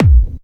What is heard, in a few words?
KICK hardcore, Techno Trance DRUMS